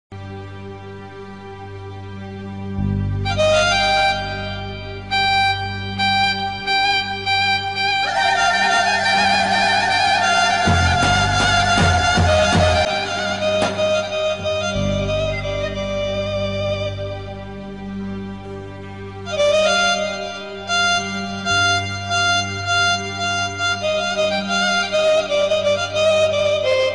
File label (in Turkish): elektro saz uzun hava